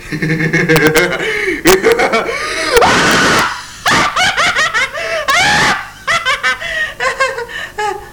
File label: laughter